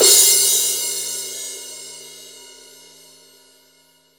CRASH; Best Service; Real Mega Drums VOL-1

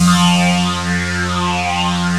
ANALOG GIANT; OMI - Universe of Sounds

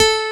CP70 C3

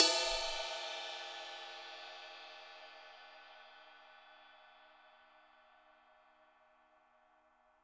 CYM; Bob Clearmountain Drums I

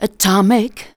vox, Techno Trance; Ueberschall

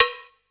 PC, Casio CTK-5000

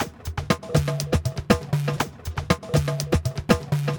Drama Drums (120BPM); musicradar

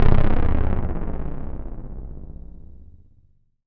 C1, Synth Line; 90 s Sample CDs; NiceBeats; SynthLine VOL-23

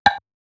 Snares, Alesis Performance Pad

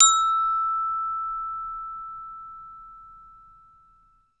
BEL ORCH; Roland - LA Composer vol. 1; STANDARD PRC

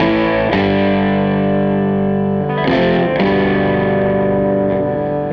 Les Guitar Loop; Sonic Foundry (Sony Creative Software) - Six-String Orchestra

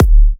D, Kicks; markus hakala

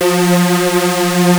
Prophet 5 F#4; Hollywood Edge - Giorgio Moroder Rare Synthesizer Collection

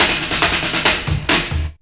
Roll Down, Drum Rolls; Zero-G Jungle Warfare 1, 2 & 3

